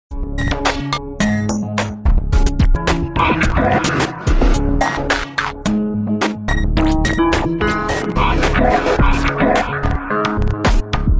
Motorola Moto G34 Ringtone

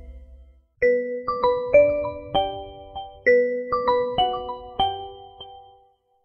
Ringtone; Oppo Reno 2

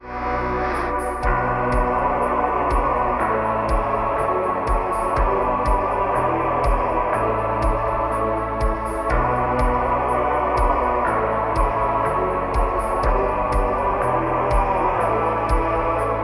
Classical Ringtone; Samsung

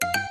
Notification Ringtone

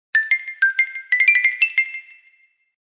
Huawei Nova 8 Se Youth Ringtone

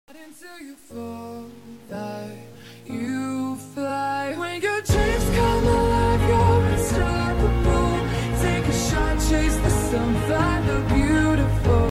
Huawei Beautiful Ringtone; Huawei Mate 40 Pro

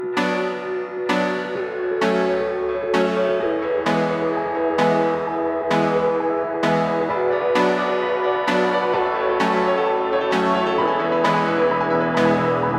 Vivo Ringtone

